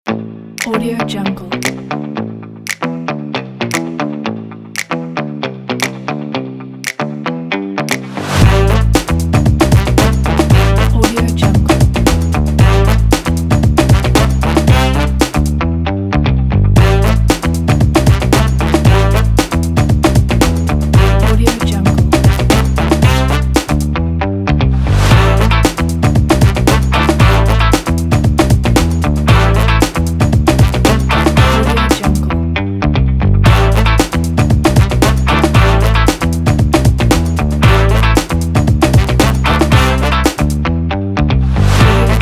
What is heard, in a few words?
funky